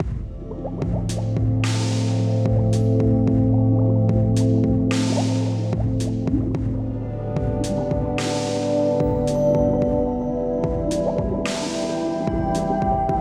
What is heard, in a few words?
underwater; Castle on the Coast; PS4